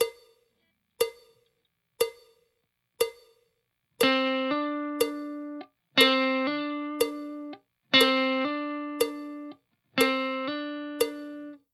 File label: blues tricks slow, guitar